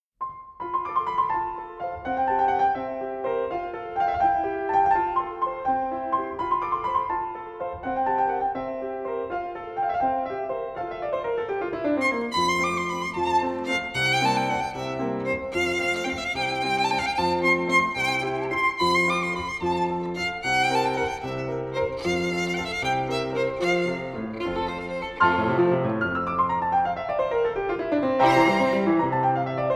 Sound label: Sonata F dur op10 nr1 Rondo Allegretto; J.Elsner